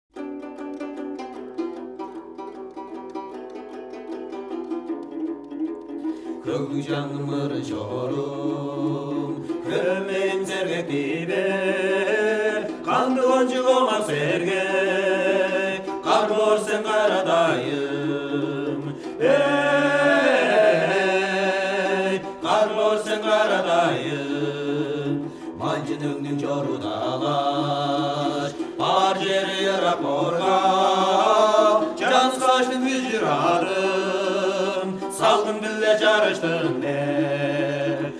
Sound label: Tuva Ensemble; REPUBBLICA DI TUVA - I CANTORI DELLA DIPLOFONIA